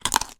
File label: eat crunch lollypop single; human